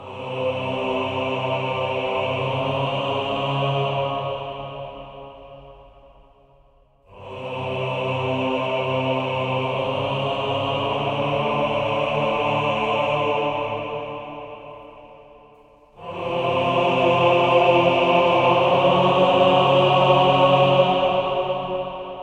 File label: Sacred Ritual Loop, RPG Fantasy Music; Sofiya and the Ancient Clan; PS4, PS5, Switch; RPG Fantasy Music Pack 02